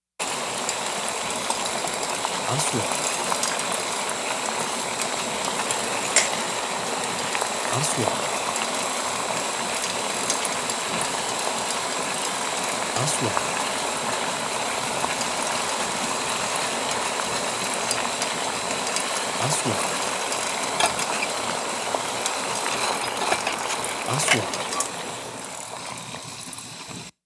صوت قلي فوح قهوة شاي سلق

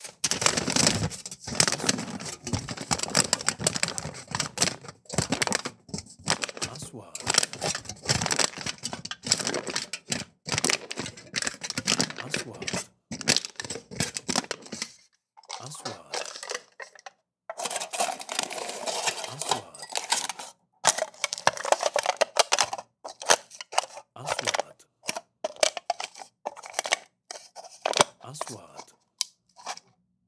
مستودع ادوات مطبخ متنوعة; sound effect; kitchen

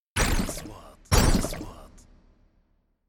نغمة اندفاع مائي سريع; transitions

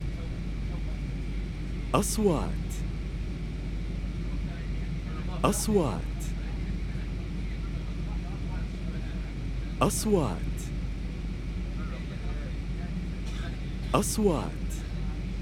صوت محيط الطائرة; airplane ambience

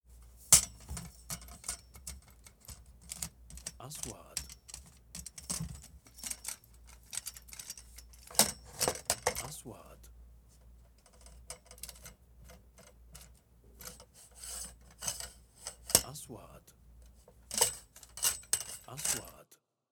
صوت حامل اضاءة التصوير; shooting

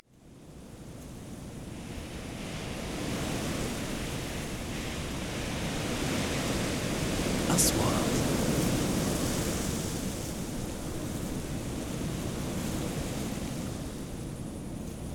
صوت صحراء; sound effect; رمال

